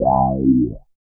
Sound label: BAS WOW; East West - Phat & Phunky CD2; 90's Sample CDs